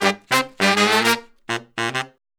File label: Horn Section